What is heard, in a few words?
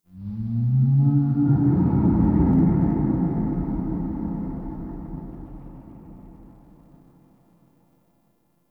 Sentient Devourer Moan Exterior